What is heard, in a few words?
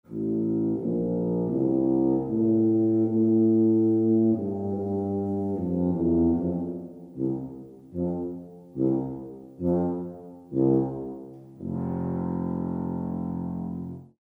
Tuba